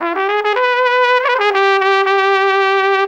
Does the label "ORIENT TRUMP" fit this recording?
Yes